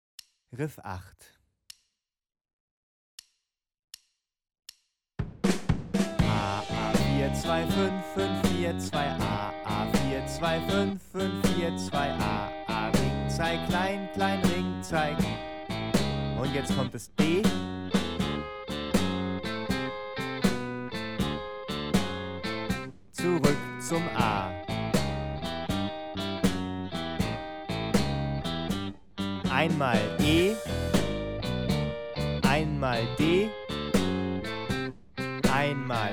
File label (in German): Riff Playback; Blues Riff 8